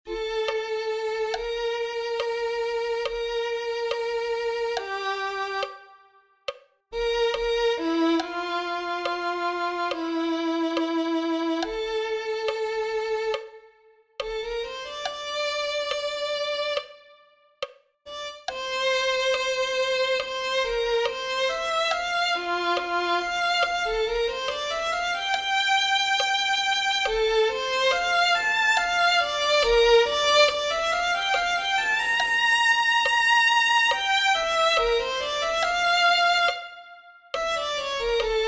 violin2

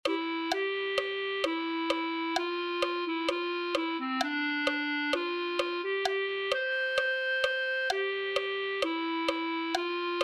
el sonido de las campanillas clarinete

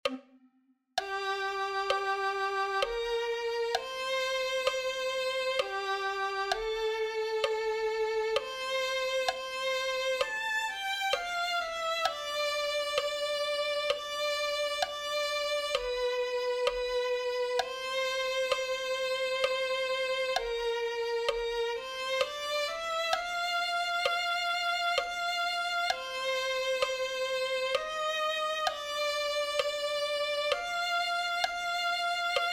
afirma nuestros pies violin

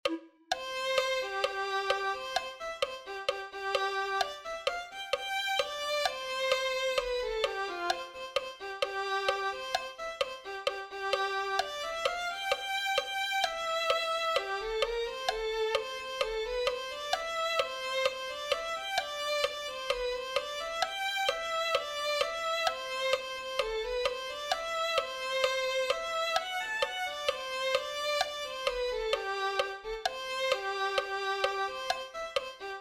violin

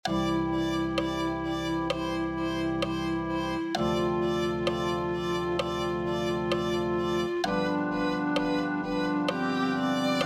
violin

